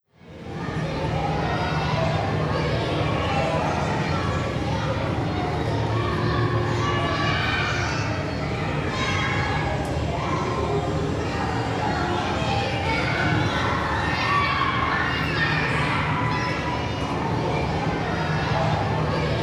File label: NYC Park walla